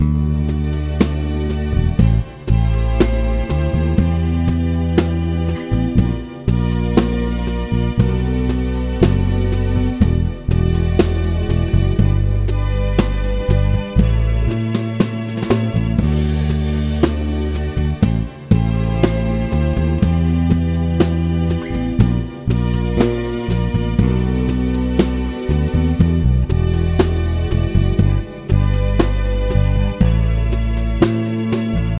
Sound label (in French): Basse batterie harmonie